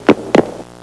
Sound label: HANGING; HAUSHALT